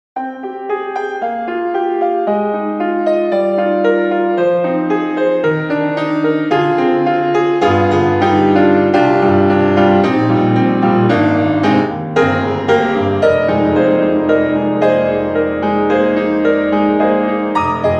Piano Mystique; ProjectSAM; Orchestral Essentials

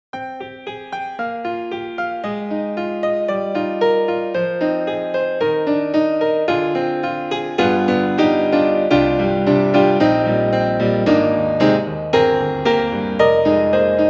Concert Grand; Native Instruments; Vienna Concert Grand; Gregorian

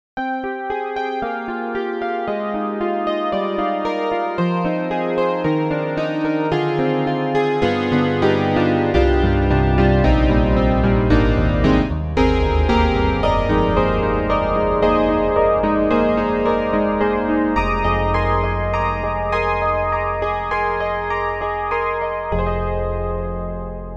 YAMAHA MOTIF-RACK XS Analog Piano

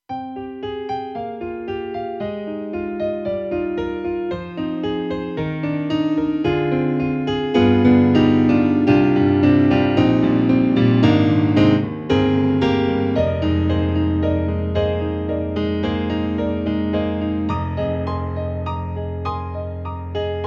Korg TR-Rack Soft Stereo Piano

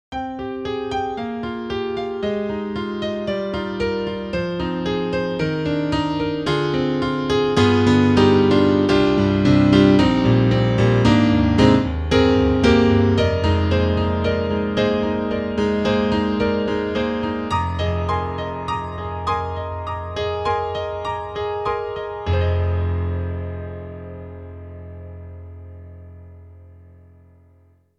YAMAHA EX5 Natural Grand

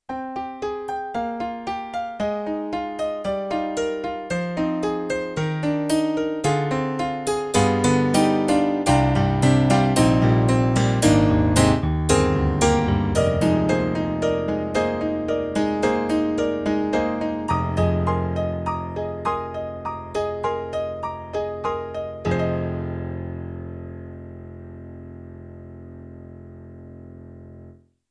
YAMAHA MOTIF-RACK ES Aggressive

